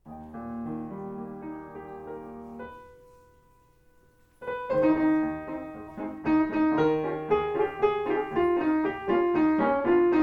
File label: Piano Vocal Dog